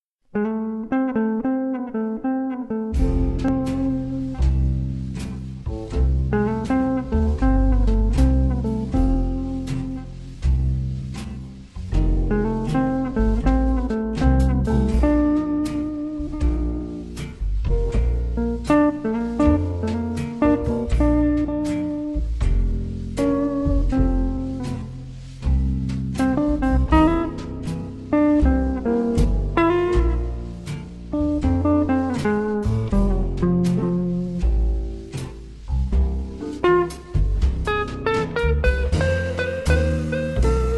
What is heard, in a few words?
(F) (Jazz guitar)